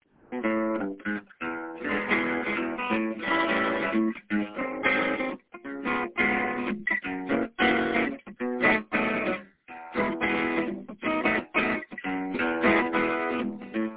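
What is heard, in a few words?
western bluesy swing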